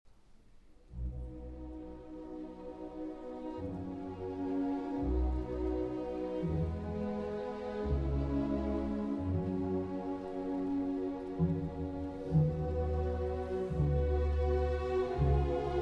Piano Concerto No. 5 in E-Flat Major

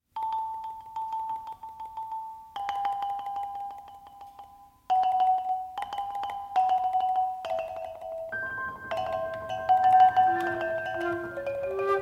Talking Gong

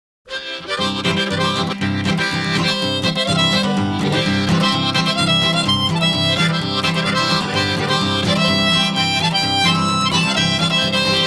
Blues

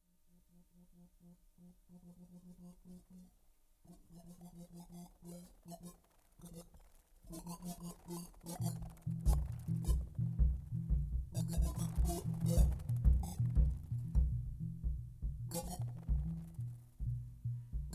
Duo Improvisation

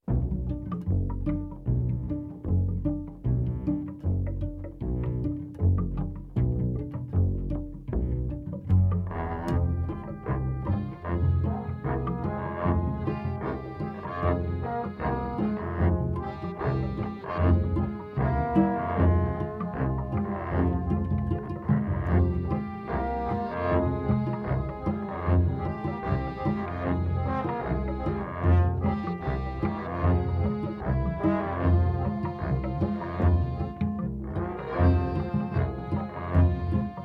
Gypsy Instrumental

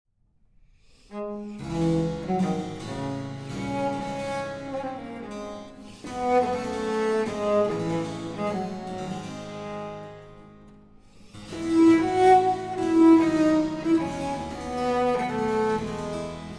Sicilienne For Bassoon or Violoncello; Gaillard

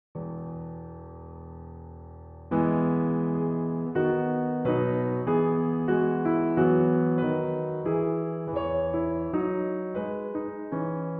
Suite for Harpsichord No. 5, Air with Variations; 'Harmonious Blacksmith'

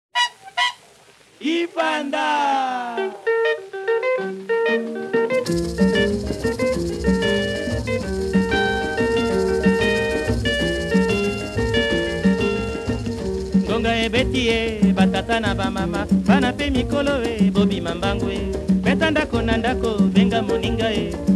African Jazz

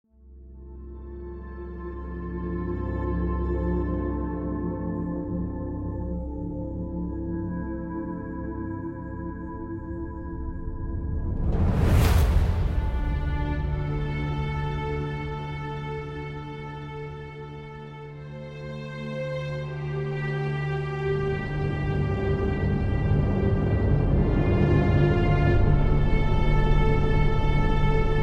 Dark Orchestral

